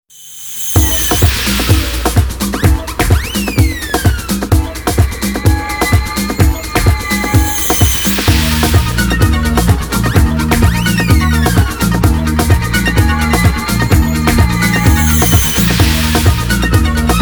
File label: techno